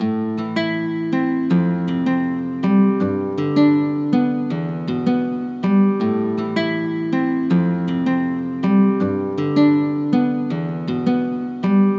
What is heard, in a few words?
Melody Loop 160 BPM A Min, Hip Hop; Melodics; Melody Loops; Cymatics; Cobra Hip Hop Sample Pack